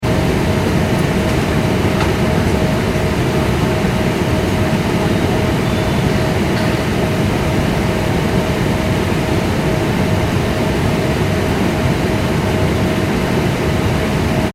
transportation aircraft commercial airbus a340 interior, In-Flight Ambience; Airplanes; Vehicles; McKinney Sound